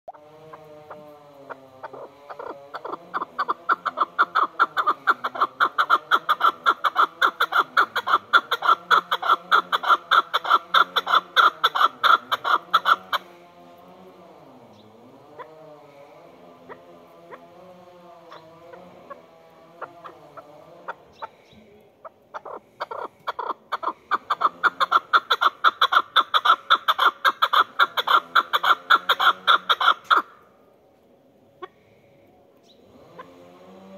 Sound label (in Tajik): ovozi kabk golos gornaya kuropatka; cucai imsola kabki safed; kekli